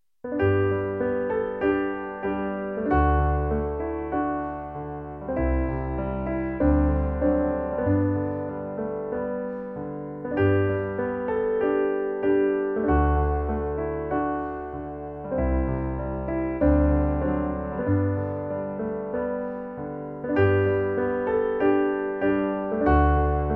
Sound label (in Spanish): PIANO; To music